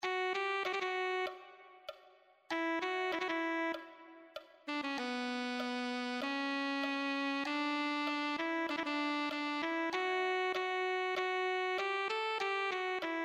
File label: alto saxophone